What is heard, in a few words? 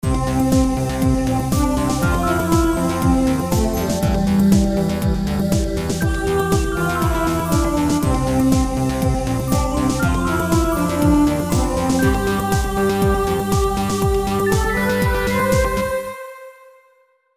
Wavestation; Pelor's Light